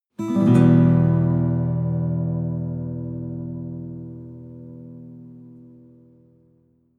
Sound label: western guitar stroke down slow